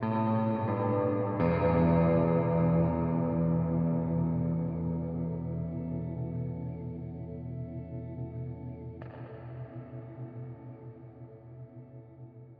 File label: guitar; Les Caprices de Marianne